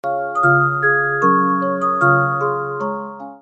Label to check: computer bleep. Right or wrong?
Wrong